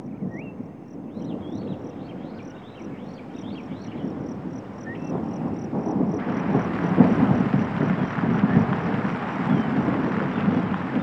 THUNDER; Zero-G Ambient 1; THUNDER+RAIN

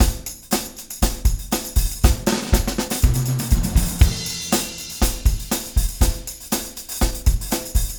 Motown, R&B Drums; Legacy; Drummers of Motown

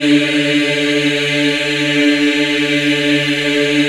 D3, Choir Acoustic & Synth; USB Soundscan vol.28; AKAI

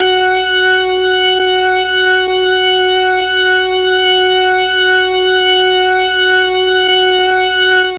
F#3 ORGAN, Mellotron MKII; Mike Pinder - Mellotron Archive CD

